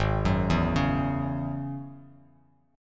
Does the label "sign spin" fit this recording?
No